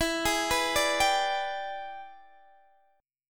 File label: E 7th Sharp 9th Guitar Open D, chord